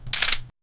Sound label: punch; fight